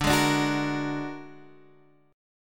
A sharp Augmented 7th D, guitar

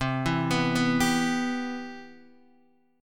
C Major 7th, Guitar DADGAD

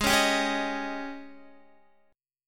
Ab Major 7th Suspended 4th, Guitar; strummed; x,11,13,12,13,11; 4F,4A,5C,5G,5C,6E; AbM7sus4; JGuitar

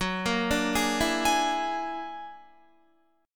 F sharp Augmented 9th F sharp Guitar; F#+9 arpeggiated

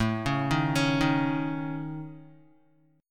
A Major Flat 5th A Guitar, chord; AMb5; arpeggiated; JGuitar

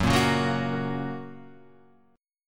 F sharp Major, Guitar, Half Step Down

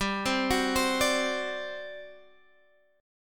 G Major 7th Suspended 4th, Guitar, Standard; GM7sus4; arpeggiated; x,10,10,11,13,10; down; capo=3

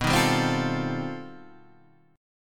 C Minor Major 13th B

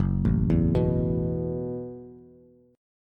Gb Minor 11th Gb Bass Bass Standard; arpeggiated; 2,0,2,4; JGuitar

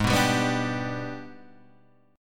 G sharp Minor Major 7th Flat 5th, Guitar Standard; strummed; JGuitar

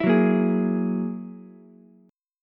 F sharp 9th F sharp Ukulele C6; F#9 strummed

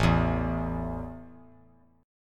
A 5th A down, chord; strummed

